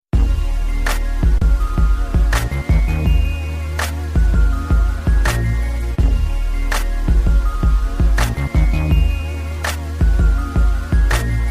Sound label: music box beat; R&B